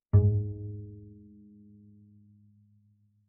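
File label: Bass pizzicato fortissimo sul D Ab2, Double Bass; MIS Pitches - 2014; Strings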